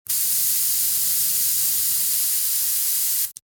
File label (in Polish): STRZYKAWKO DMUCHAWKA